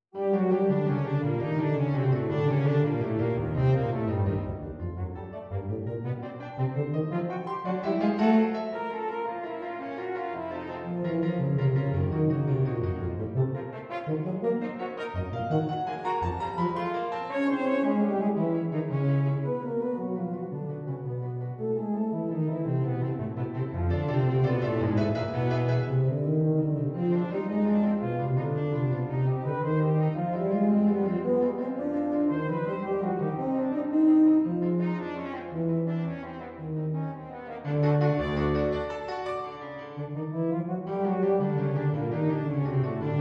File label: five quirky miniatures tarantella